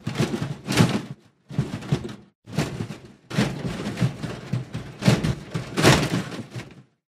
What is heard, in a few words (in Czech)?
PREVRACENI AUTA; pat & mat game